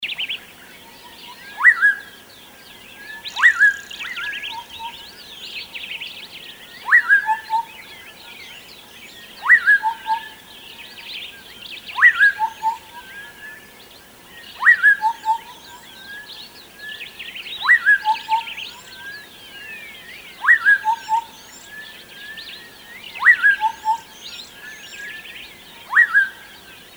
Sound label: various birds